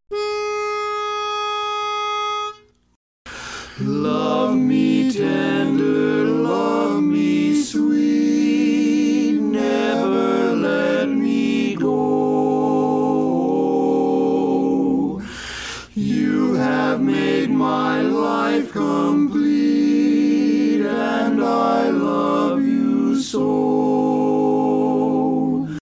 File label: Without Bass, chorus; Enrichment Tracks